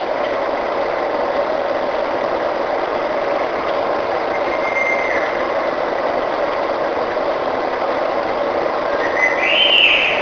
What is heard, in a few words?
Whistles